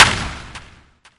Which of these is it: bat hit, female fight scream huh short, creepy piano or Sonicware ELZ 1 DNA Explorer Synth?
bat hit